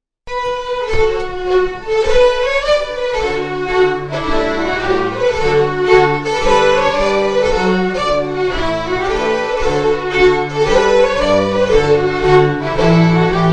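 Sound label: Strathspey, Scottish Fiddling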